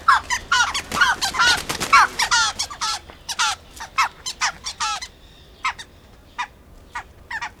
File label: Eudocimus ruber duet